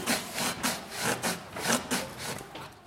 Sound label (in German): fuesse abstreifen